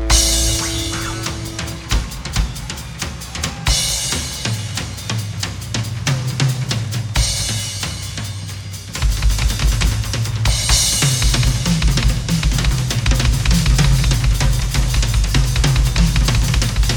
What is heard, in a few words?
Drum Solo